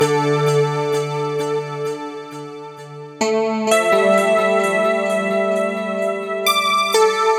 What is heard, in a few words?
130 D Phr Lost Keys; Zero-G; Future Wave